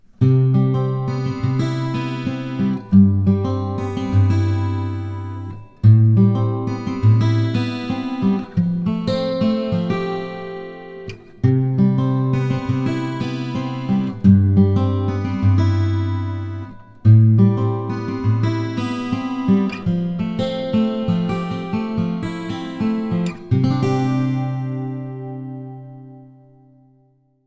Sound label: Fingers chorus reverb